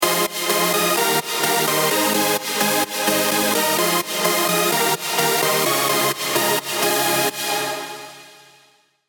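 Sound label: B flat minor 128 bpm, Big Room & Progressive House MIDI Melody; Precision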